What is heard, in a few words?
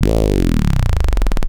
Deep Drop, transition samples